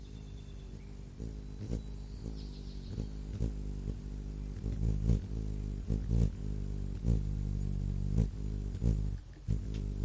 kolibri

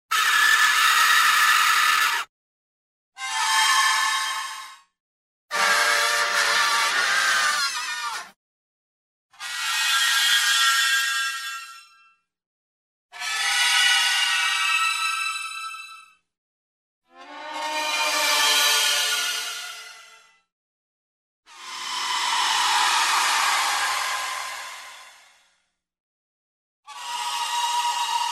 iz filmov ujasov

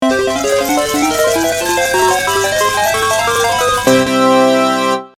djekpot; Звуки игровых автоматов, однорукого бандита

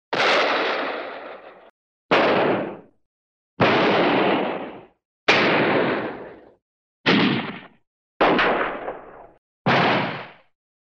strelba iz pistoleta

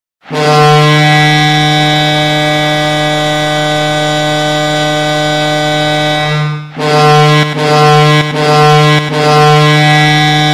zvuk sireny i melodia; s krikom gol

